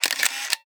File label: CAMERA Minolta 505 Shutter; SmartSoundFX; Mavic Travel Filmmaker